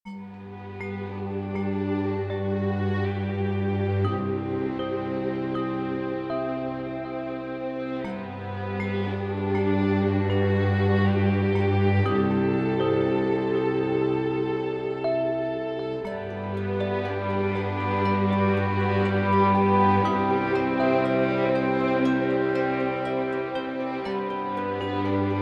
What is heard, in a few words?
Wonderful Ambient